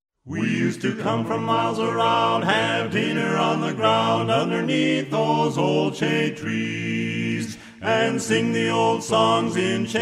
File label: An Old Convention Song No Tenor